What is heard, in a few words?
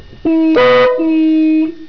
fanfare horns